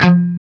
CHUG F 2 R, Guitar; East West; Steve Stevens Guitar Samples Collection

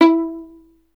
STR VLN, Solo Instruments; Miroslav Vitous

